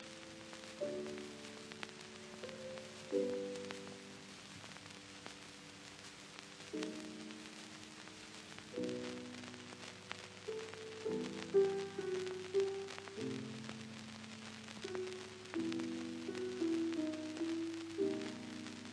Piano Concerto No 4 in G major Op 58 II Andante con moto; Beethoven